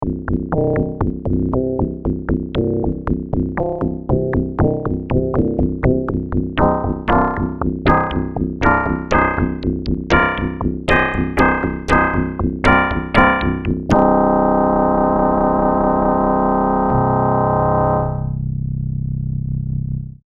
Mud Acid, Prophet '08

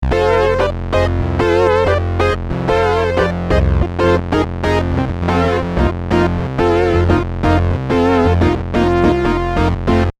Native Analog, Prophet '08

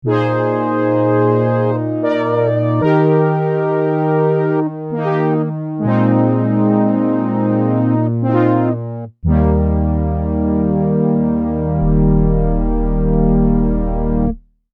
4 Osc Big Softie, Prophet; Prophet '08

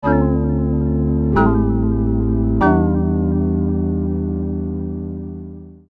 Dying Flock, Prophet '08

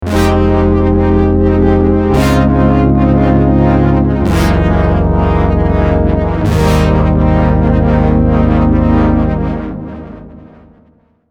Evil Filter, Prophet '08

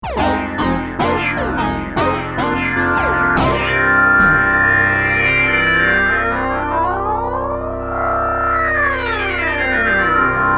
Sync Glider, Prophet '08

